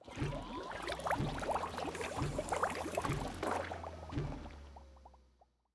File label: Turret Bubbles Large